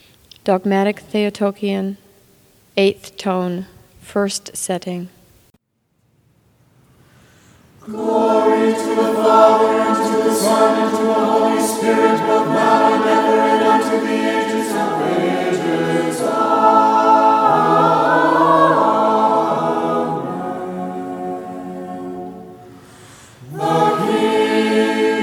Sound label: Dogmatic Theotokion Tone 2; Setting 2